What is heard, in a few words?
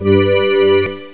me hit new life; SpaceInvader! 1.04